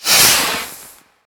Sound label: witch death